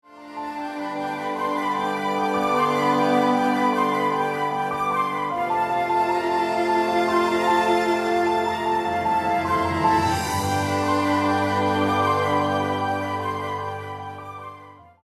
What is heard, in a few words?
romantic